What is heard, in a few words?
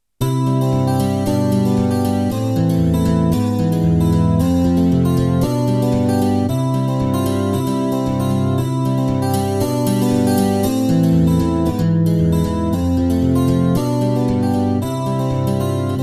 yamaha ex